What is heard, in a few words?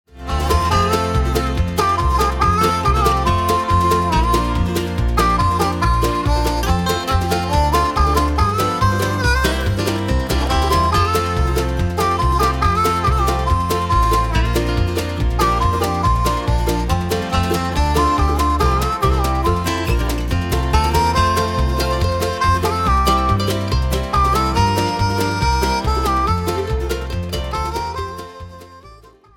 Bluegrass Medley